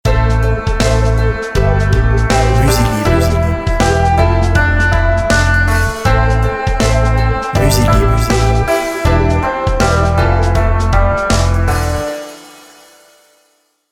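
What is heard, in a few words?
chinese banjo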